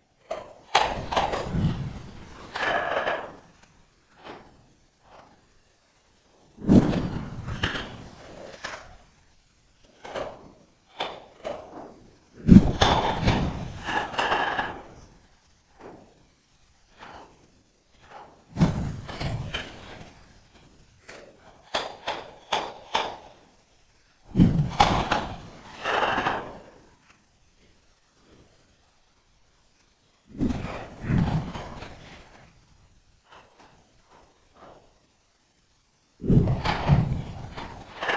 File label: rug